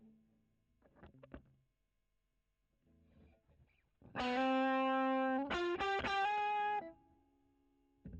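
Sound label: E.GTR OD, electric guitar overdrive; Gefell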